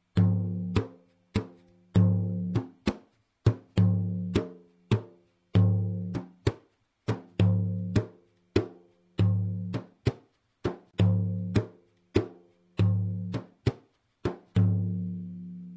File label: tar, frame drum; notations